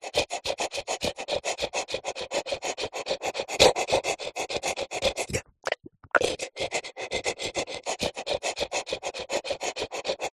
dog large pant lick lips; animals; zapsplat

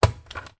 household sandwich toaster lid open; sound effects one

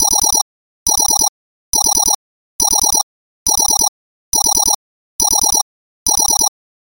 science fiction console alarm; sound effects one

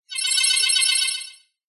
game sound swell collect bonus item

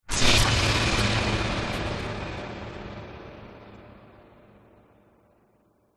sound design cinematic hit bright stab flash fear

